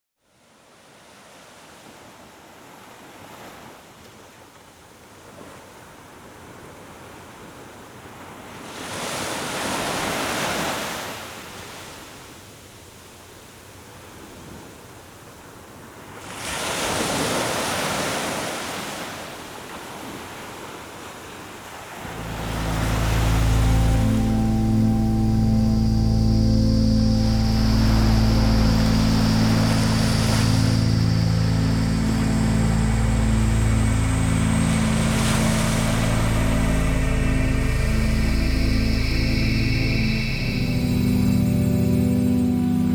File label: Ocean, Relaxation Music; relaxing music of Slow Drift with the Ocean